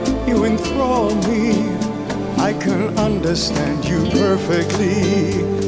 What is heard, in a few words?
music; wu xing; earth